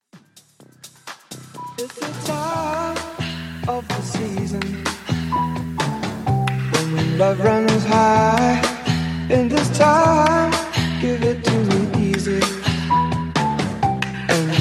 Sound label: Tech House Intro; Remixfreakz